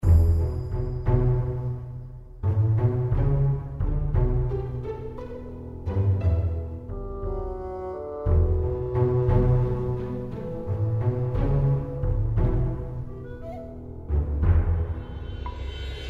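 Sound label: background music prebattle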